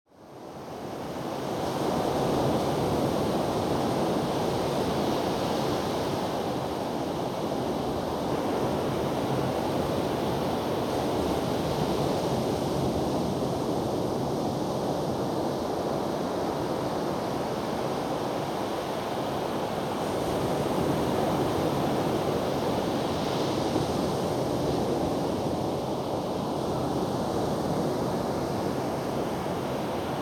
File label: seashore